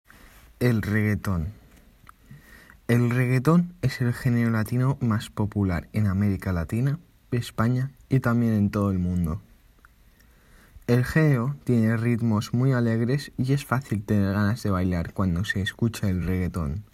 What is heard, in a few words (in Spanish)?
Reggaeton; Forlaget Plenum